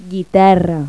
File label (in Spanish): GUITARRA; Pipo-Aprende musica con Pipo